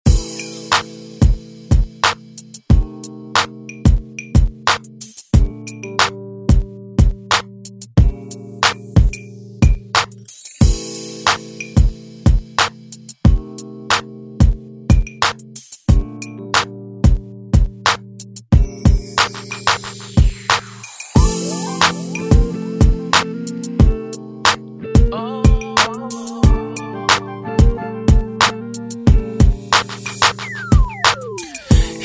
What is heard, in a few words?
Straight Kutz; DJ Mhark